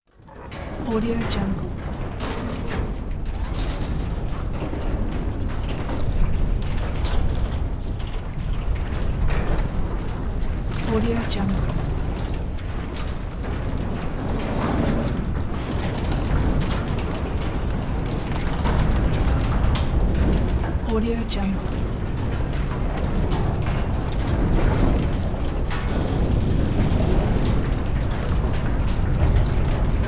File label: metal ship sides shaking vibrating and rattling